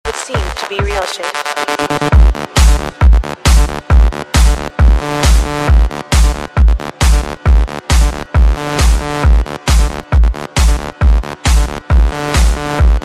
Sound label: Hot Beats